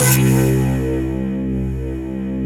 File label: SCRAPE C2, Synth; SCRAPY